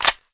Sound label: ak47 magin; weapons